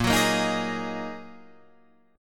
Bb 7th Suspended 4th Sharp 5th Bb down Guitar Drop D